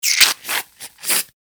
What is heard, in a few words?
bathroom foam spray